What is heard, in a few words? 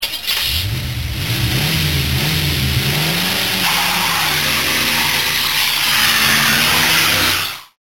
car start drive